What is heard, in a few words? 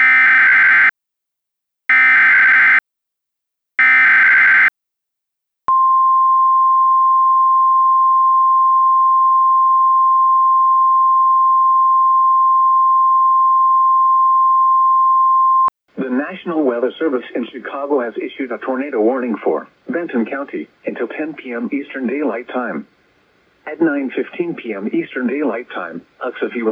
warning